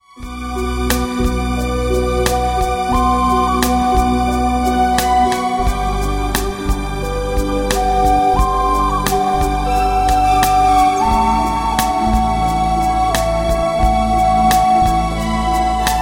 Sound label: Flute Dreams